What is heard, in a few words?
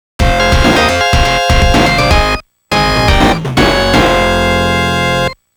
GAME OVER, AC Ver.; senjou no ookami top secret original sound collection 2015